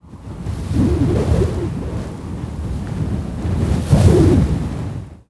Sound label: field wind; free 2 play